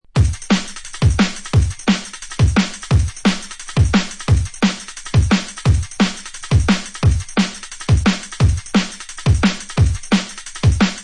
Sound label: Dread Shuffle, beats; ray keith dread jungle breaks vol 1 60 beats for dubplate clashes battle junkies